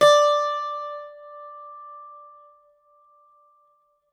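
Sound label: bouzouki D5; SoundBytes; Early Patches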